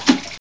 Lilypads; barn

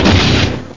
Crash Thru Door

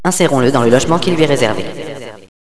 kit; Villagina; ecole

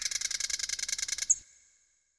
Rock bird; ImpGal2; colony

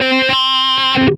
dist wah C4, Guitar Special, distorted wah, notes

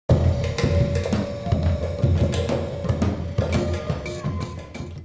Drums Native; VlogIt

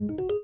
blocked pop-up

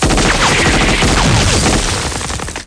soft

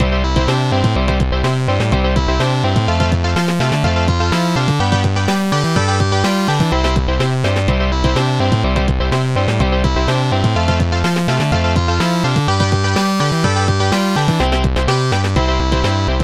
jingle; PowerModul 2; Loop Protracker Module

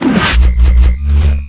menu intro; balloon rain

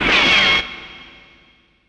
slam; groundwar

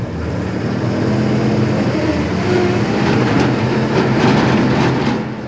movement

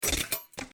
metal; the project

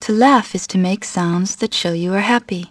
laug

